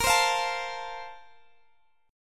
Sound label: A sharp Major 7th Suspended 2nd, down, Guitar, Drop D